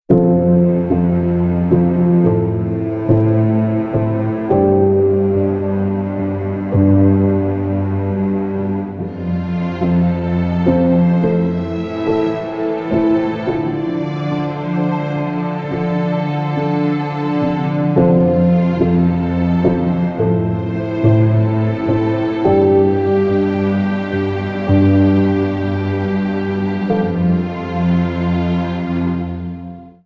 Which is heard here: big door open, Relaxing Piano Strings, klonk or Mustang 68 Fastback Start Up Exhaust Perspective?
Relaxing Piano Strings